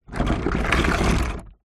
household plant pot large plastic drag on hard ground